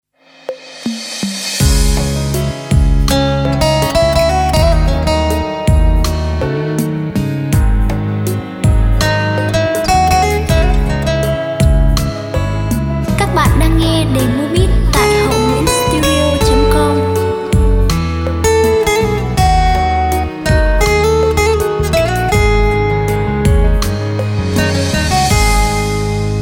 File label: beat, playback